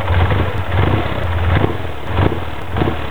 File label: good flip, Carburetor; Tune Up; Carburetor Air Cleaner; FCR; setting FCR